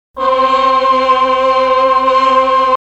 Sing; Hylics

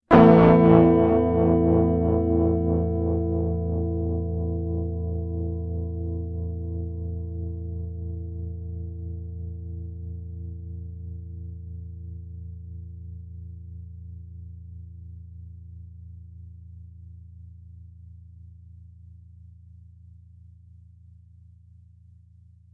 A minor up; Hylics

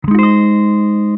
GS7 down; Hylics

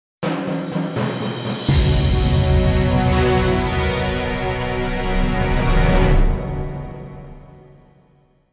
Jingle; Fate Tiger Colosseum; Fate-Tiger Colosseum ORIGINAL SOUND TRACK [Complete Edition]

